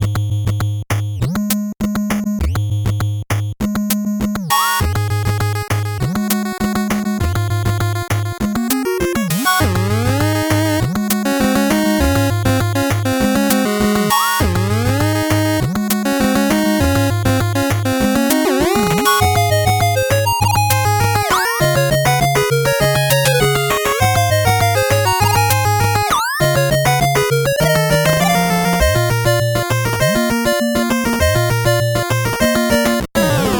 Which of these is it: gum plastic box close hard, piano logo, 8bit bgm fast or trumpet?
8bit bgm fast